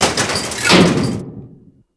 kid die

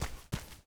Run; Hayreddin; Seer; GamigoNA

